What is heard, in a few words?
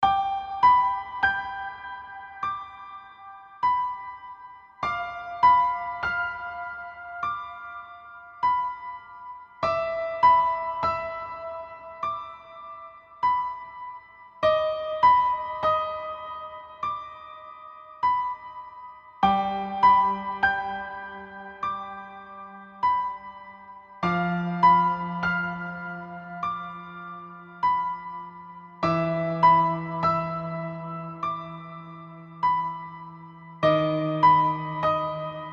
piano version